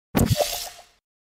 portal gun fire; portal 2; orange

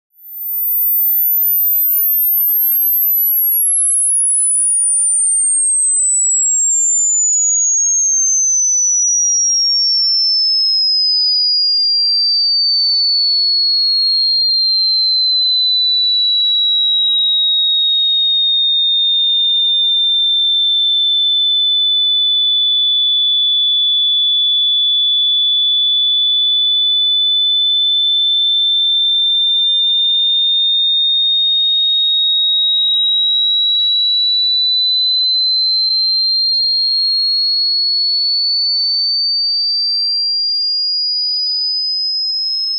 100khz effect

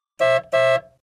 car honk; chiikawa; anime manga

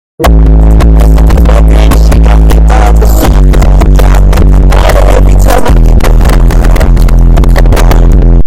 bass boosted max

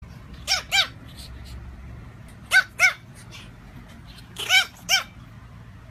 tiny dog barking

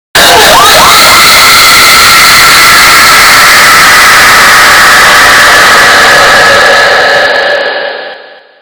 loudest scream ive ever recorded; reactions

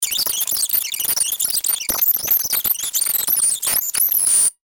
fast forwarding reversing sound

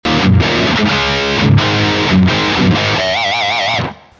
tinkertrain riff

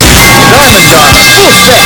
metal pipe; armour full set; movies

